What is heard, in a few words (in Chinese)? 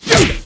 男受伤; renlei